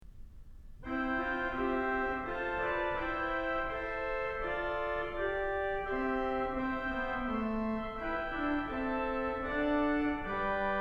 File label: Clarion; Kellogg Auditorium